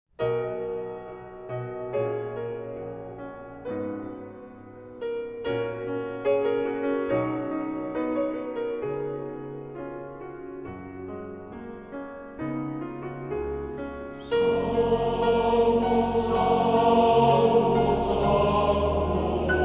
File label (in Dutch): Santo, mannenkoor